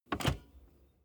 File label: car bmw e46 interior seat adjustment lever short